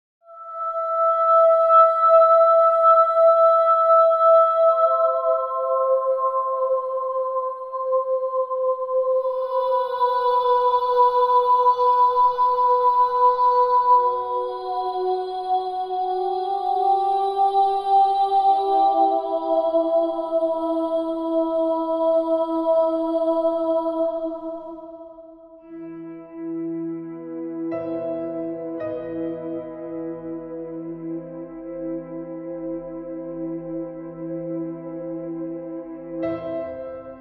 short scary music theme with dramatic murder ending